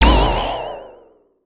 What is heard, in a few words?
wand hit; weapons; aronwk collection; ndaudio; Repulse Wand